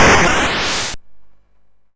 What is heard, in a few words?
YM Noise; NOISES; ChipShop Deluxe; ChipShop Hits